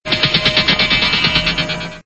bionic sound

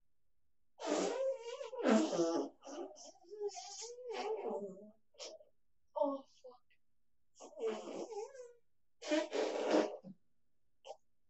farty fart

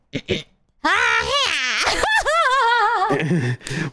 haaaa hyaaa

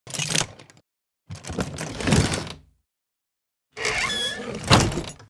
treasure chest

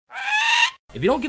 bird, bird noise

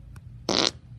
epic loud fart

